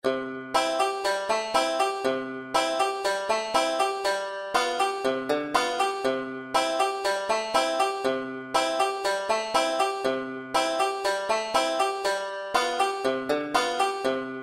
banjo pick buffalo girls c banjo only; buffalo gals

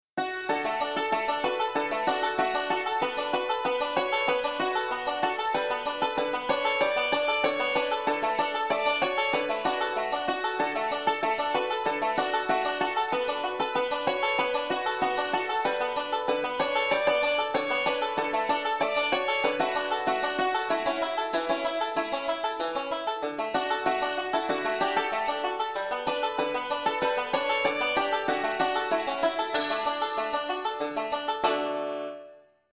whiskey in the jar, banjo; beginning 5 string

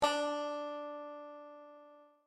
banjo d note; beginning 5 string

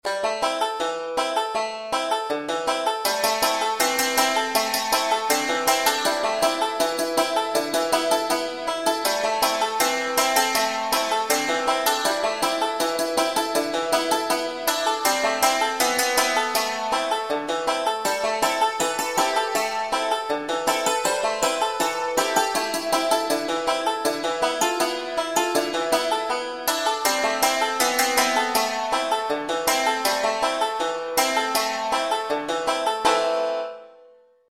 banjo, 5 string; tarriers am

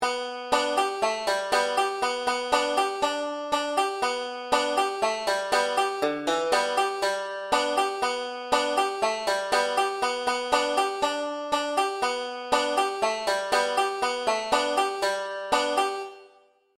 chorus, banjo; 5 string; cripple creek

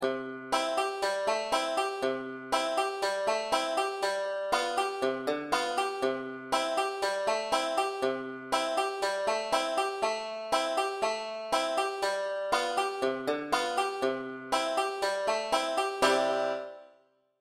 accompaniment banjo only; 5 string; aunt rhody

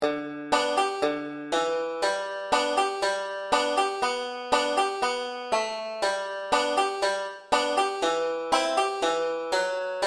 this lil light banjo solo no melody; beginning 5 string

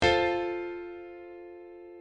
piano chord f; circle of fifths

